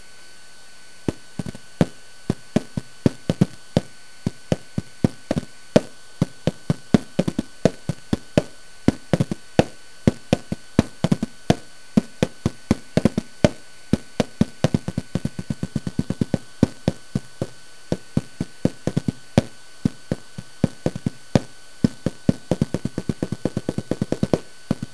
Drum

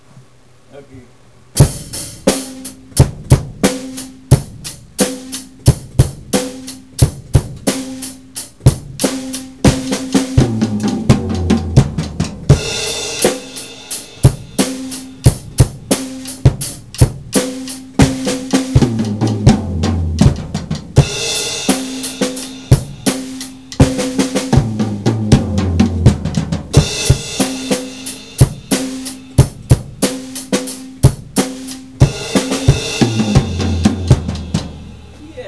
bryan solo, drums

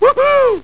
woohoo

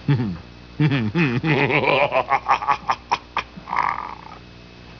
laughs

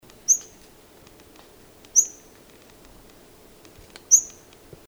Vinaceous Rosefinch, birdcall

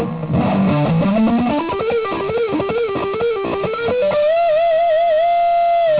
runnin train

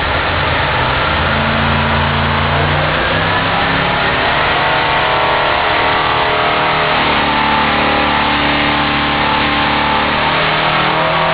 through glass

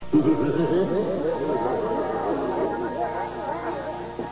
klown laugh

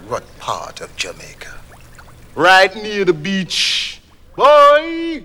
beach

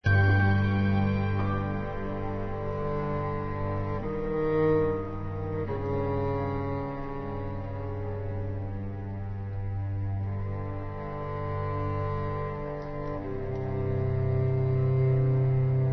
music

